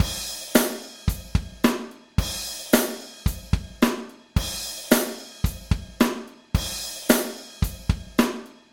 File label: Hard Rock 110